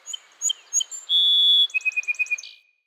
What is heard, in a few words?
Tree Birch Bird; Trees